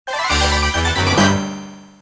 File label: Beat Him Down; MPDS